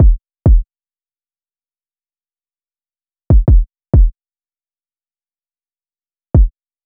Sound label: Drum Loop 138 BPM Kick; Loop Stems; Cymatics; Vibes II Drum Loop Collection; Rings